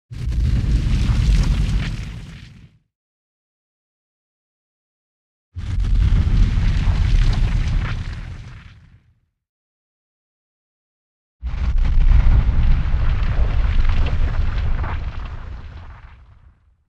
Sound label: Explosion